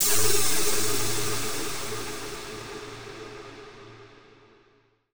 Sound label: TECHNO FX 140